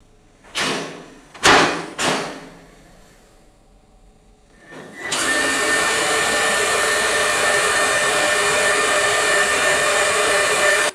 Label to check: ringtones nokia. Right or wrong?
Wrong